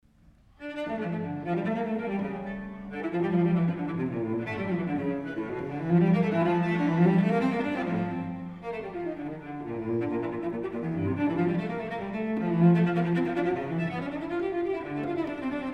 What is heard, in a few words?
Cello Suites, D Minor, Courante; BWV 1008